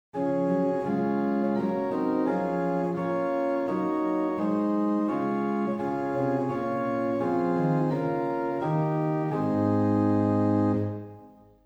Rohr 8 + Spiz 4, organ stops; Reinhardtsgrimma

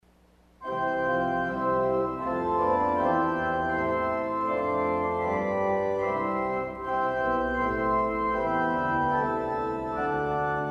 St Anne Flute Chorus, organ stop; CulverAcademy

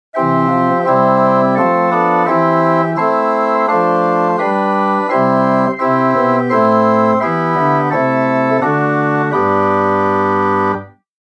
Holz 8 Prest 4 Flach 2 Sesqui, organ; Buzard Opus 3

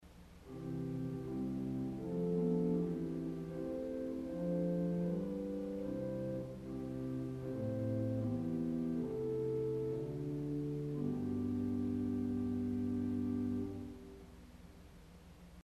Gemshorn St Anne, organ stop; CulverAcademy

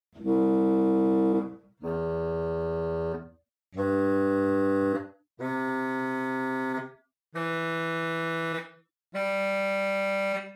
Krummhorn 8 arp, organ; Buzard

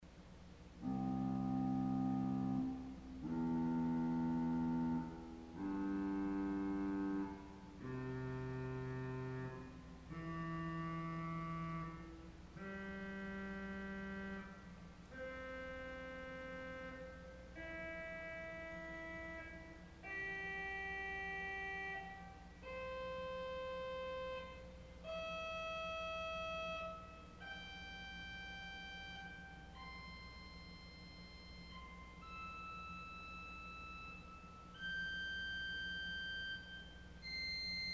Vox Humana Arpeg, organ stops; CulverAcademy

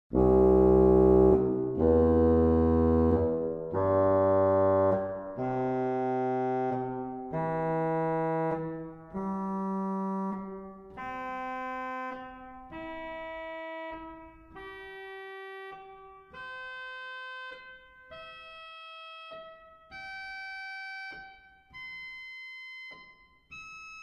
Basson; CavColMainz

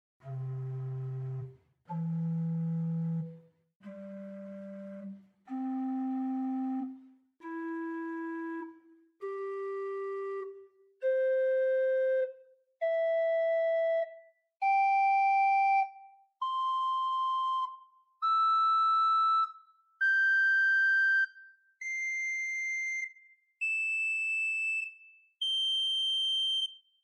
Rohrflote 4 arp, organ stops; Grace Lutheran, Champaign

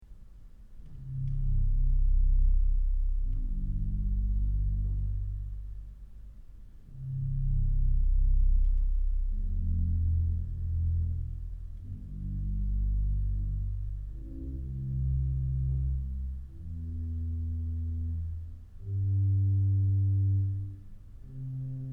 Melodia; Kellogg Auditorium

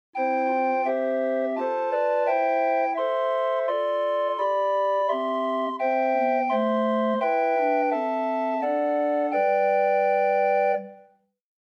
Bw Rohrflote4; Grace Lutheran Champaign

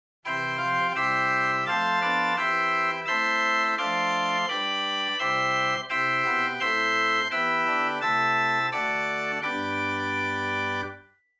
Gedackt Rohrflote Prinzipal Quinte, Chamber Organ

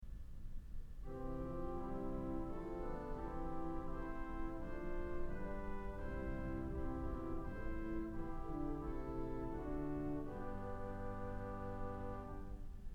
Sw Salicional, organ; Kellogg Auditorium

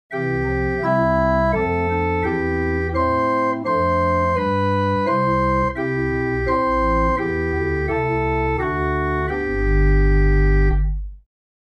Sub Bass Quint Holz Prest Sesqui, organ; Buzard Opus 3

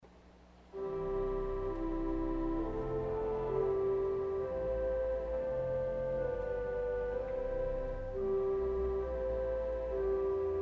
Doppelflote St Anne Solo, organ stops; CulverAcademy

